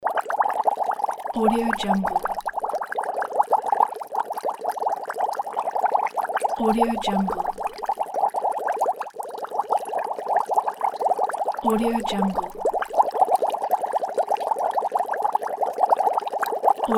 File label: bubbles boiling